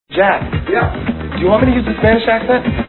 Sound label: accent